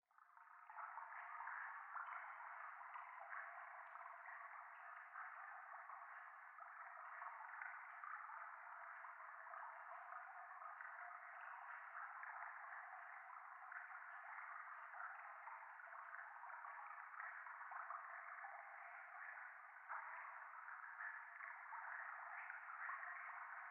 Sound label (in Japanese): 廃墟 フェラチオ 川のせせらぎ